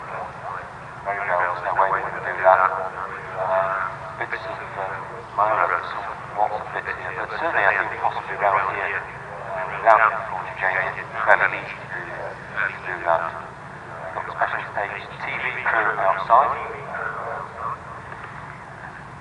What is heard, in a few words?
Amb Generic; Dirt 4